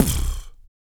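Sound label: vox percussion, Vocal Planet Jazz FX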